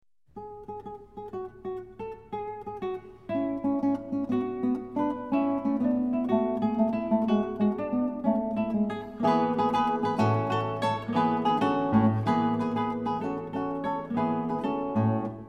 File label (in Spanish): Musica Incidental Campesina; Leo Brouwer